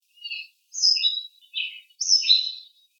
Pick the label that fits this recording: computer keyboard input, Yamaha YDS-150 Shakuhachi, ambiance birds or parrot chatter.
ambiance birds